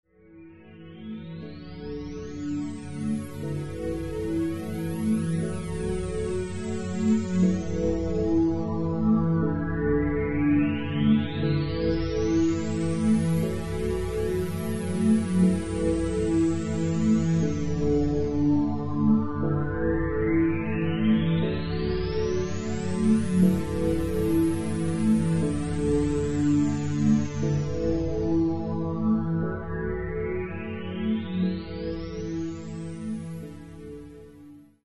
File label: isochronic binaural theta background track; hypnosis programs